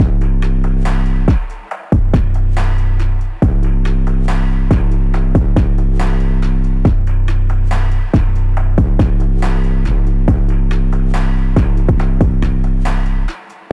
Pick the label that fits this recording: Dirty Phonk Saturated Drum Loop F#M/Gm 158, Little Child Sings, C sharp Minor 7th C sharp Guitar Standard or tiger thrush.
Dirty Phonk Saturated Drum Loop F#M/Gm 158